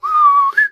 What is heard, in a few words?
ninja star windup